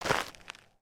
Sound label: Foot Dirt Sneaker Walk, footsteps